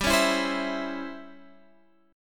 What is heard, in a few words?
C sharp Minor Major 7th Flat 5th G, chord